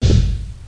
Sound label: menu ok